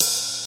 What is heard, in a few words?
1970s soft open hat sound d sharp key, Royality free open hat tuned to the D# note